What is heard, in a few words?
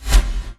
DEFLAGRA, anime sound fx, comic